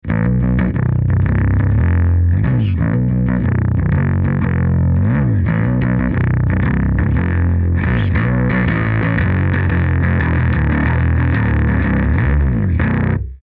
Pick Ibanez SR1205

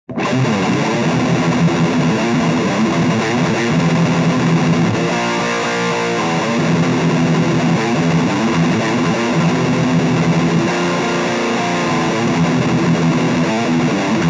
Crush Heavy Rhythm Close Micd

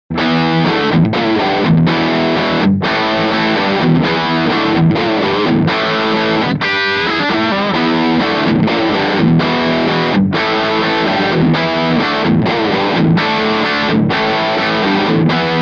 Rocker 15 Head Rock

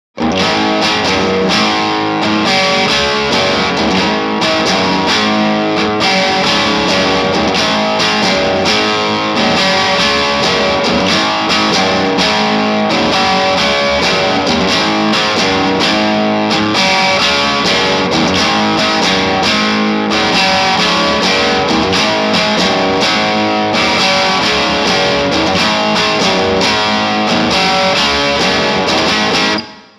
Distortion Low Gain Clean Amp Vintage Pedal

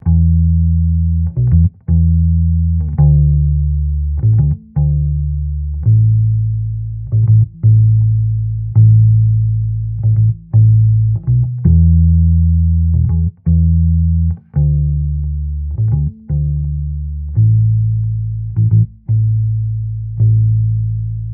Crush P Bass Mellow

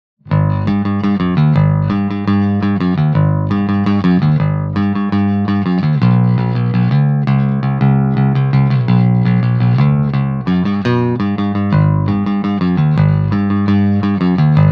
Crush Bright O Bass

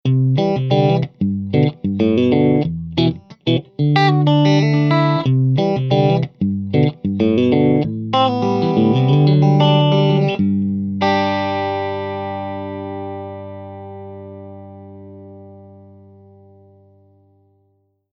Dual Terror PPC410C; G n L Commanche

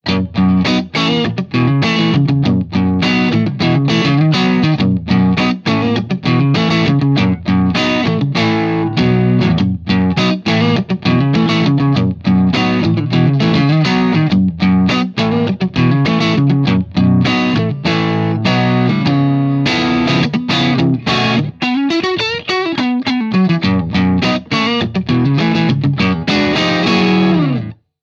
Terror Stamp Blues

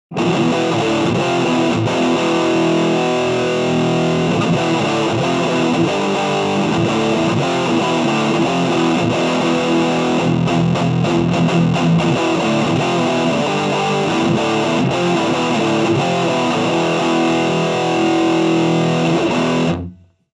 Crush 35RT Heavy Scooped Rhythm

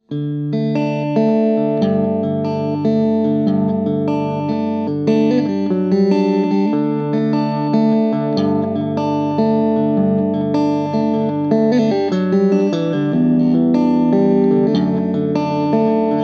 Crush 20RT Clean Mellow

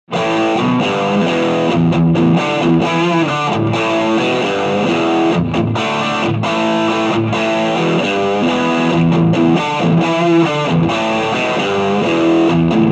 Crush Dirty Rhythm Slight Reverb

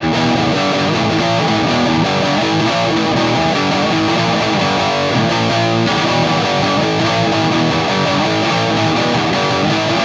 Punk Rock

